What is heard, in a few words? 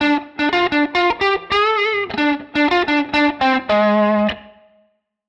Guitar Line, hiphop; musicradar